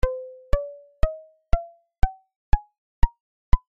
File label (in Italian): scala temperata 19